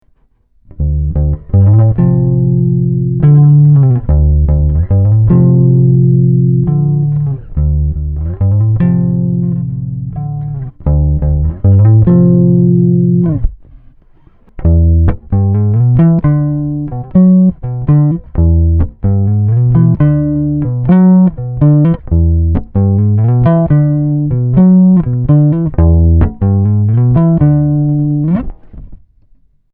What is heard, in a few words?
bass test